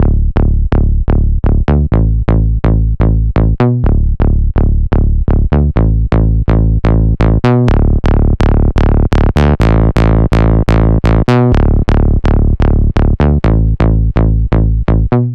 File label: Clean Saw Pluck